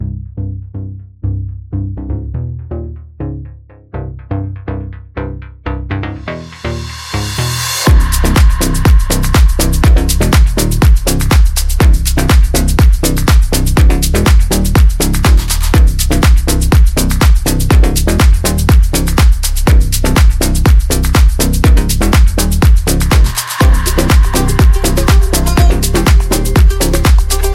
Eastern Pulse, house

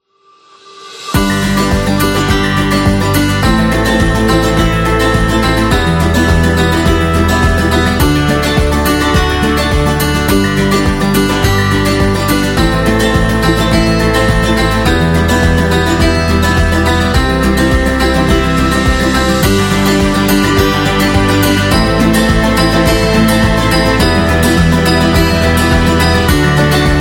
Food Happy

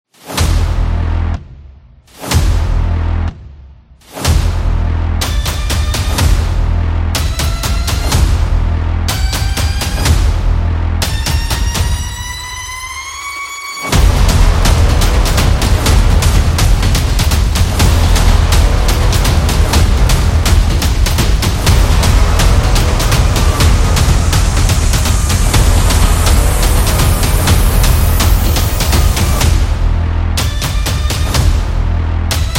Epic Intro

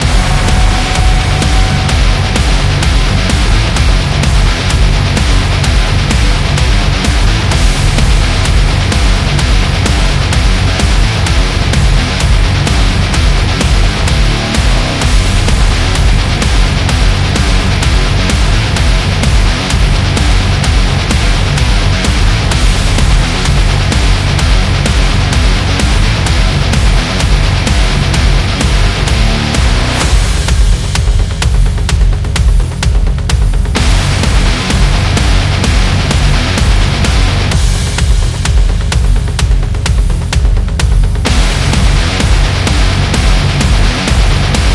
EMOM Metal

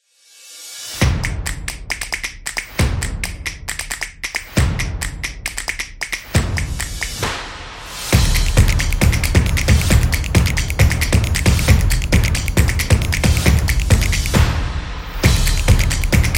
Drums Action Percussion Beat; sports beat

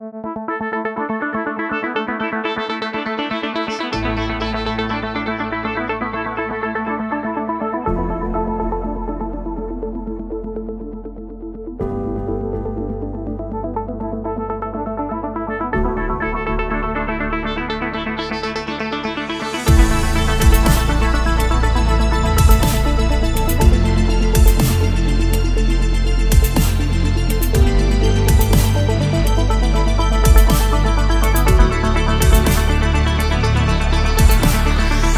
Futuristic, Futuristic background music; ai

